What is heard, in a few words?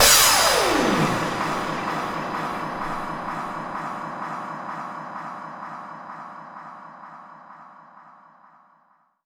FX Downlifter